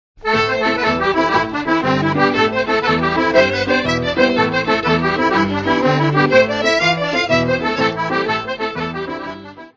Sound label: accordion